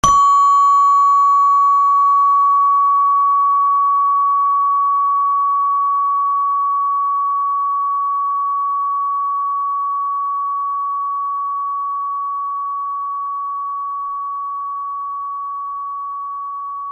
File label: space bar merkur